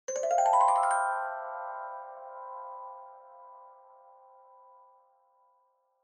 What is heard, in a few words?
Music Box Reverb Short